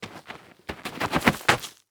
Foley Footstep Golf Cleats Shuffle On Dirt; Foley Footsteps

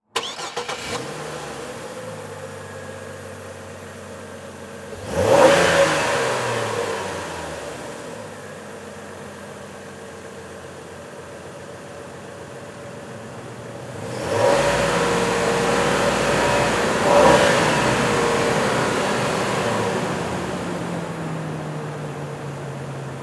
Truck GM Envoy Start Idle Rev Off Exterior; transportation

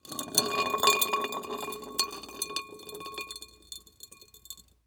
Glass Roll On Cement; Foley

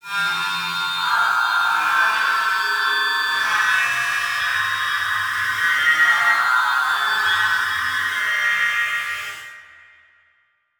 Title Transition Stab Dark Long

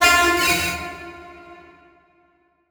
Transition Bell Hit; Adobe; Production Element

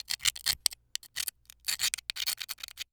Foley Glass Sharp Glass Scratch Multiple

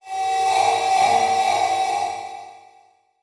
Transition Metallic Warble; Production Element; Title Transition; AdobeCC

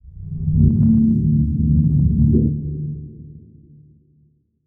Title Transition Swell

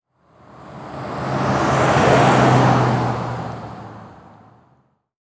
Truck GM Envoy Drive By Slow; transportation

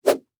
Foley Stick Plastic Stick Whipping

